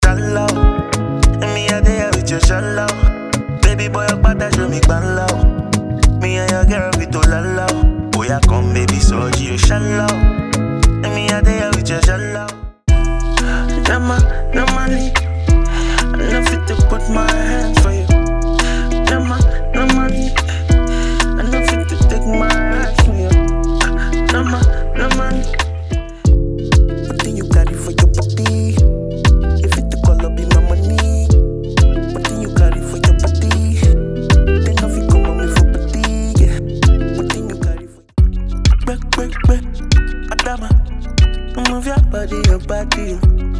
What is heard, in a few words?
Afro Bashment, Afroswing Sample Pack; Mykah AfroBashment Vol 1